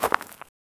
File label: footstep on dirt; earth